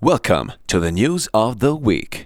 vox; Ueberschall; Techno Trance Essentials

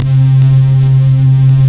SAW FUNNELS, TECHNO; Pro-Rec - Synthsonic; Z1 TECHNO

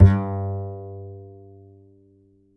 D string upright bass; Upright Acoustic Bass

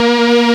ENSEMBLE, Fairlight CMI, ANALOG

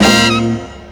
Horn Hit, Drum & Bass One Shots; Misc

